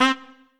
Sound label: Trumpet melo